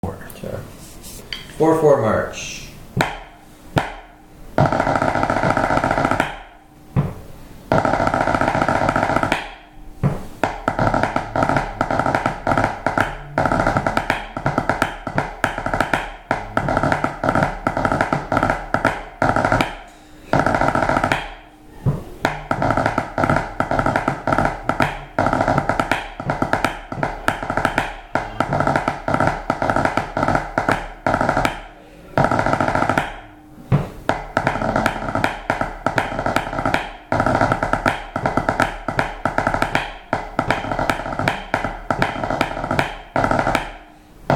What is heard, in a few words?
Massed bands Drum Beating 2 4; drummers music library